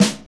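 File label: snare, Motown; Northstar; Drumscapes Roland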